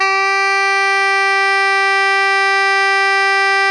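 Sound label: soprano saxophone G 3, fortissimo; FF; Greytsounds; Philip Wolfe David Crozier's Saxophones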